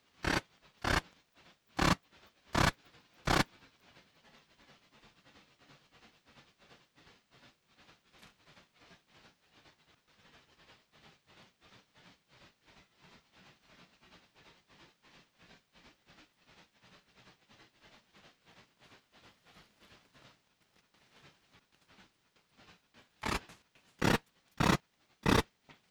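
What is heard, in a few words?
Heterodyne R poneli strophes; Tarascon-13; J-Barataud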